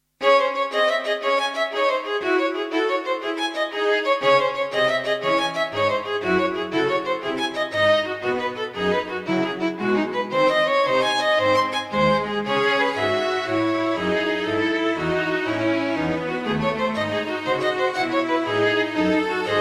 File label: strings only, orkestraal; klassiek; Strijkorkest 1